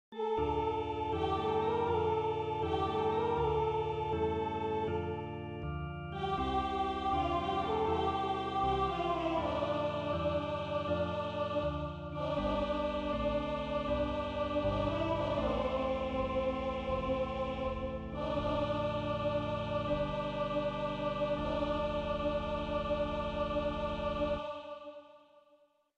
Priere universelle en arabe hebreu latin; Messe de Verrieres

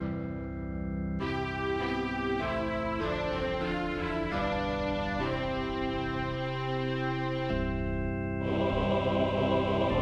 Gloria court en Ut M polyphonique; Messe de Verrieres; avec generation audio

